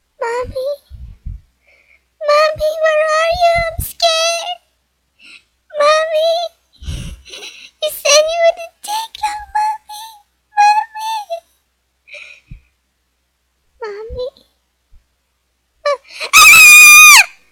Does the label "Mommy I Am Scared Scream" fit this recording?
Yes